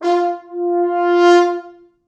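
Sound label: French horns, four, f3; East West; Quantum Leap Horns Sax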